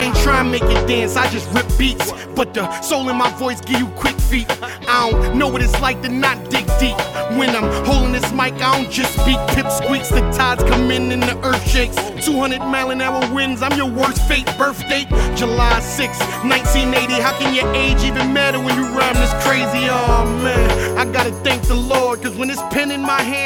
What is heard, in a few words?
hip hop